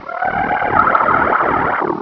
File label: attack; svencoop; keen